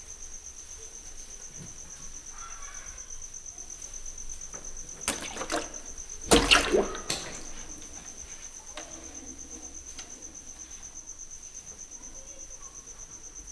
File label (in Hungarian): ahogy a vízbe dobott kárászra ráharap, 60 cm hosszú aragadozó, keselyűteknős; jaszberenyi zoo 2016